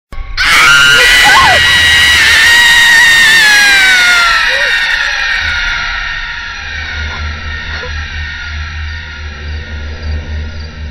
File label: Horror; Raaz